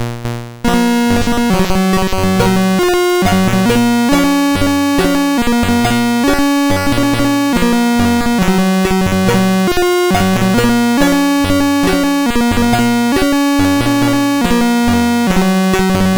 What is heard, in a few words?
Randomly generated music BASIC, sid MOS6581R2